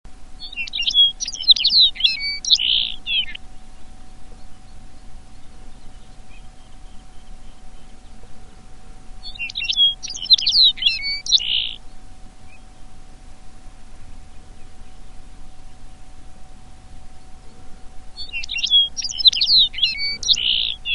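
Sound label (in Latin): calcarius lapponicus, trznadlowate; poświerka - śpiew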